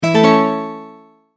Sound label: multimedia tone start; Sound Design; McKinney Sound